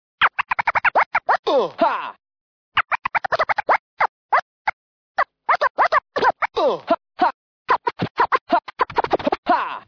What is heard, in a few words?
scratch